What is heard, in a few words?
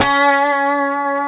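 C#4C, compressed Strat, guitars; Spectrasonics; Hans Zimmer Guitars VOL-1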